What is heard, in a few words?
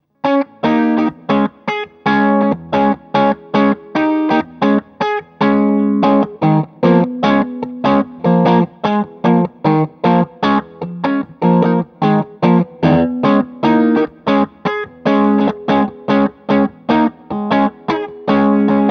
Crunch